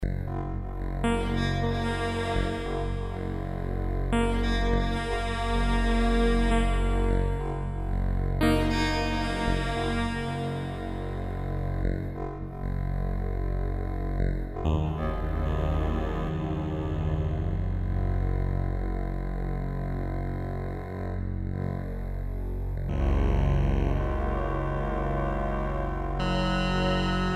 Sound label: yamaha tg33; raw 1